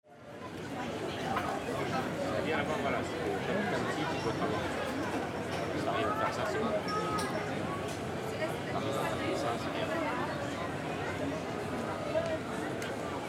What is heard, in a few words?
foreign crowd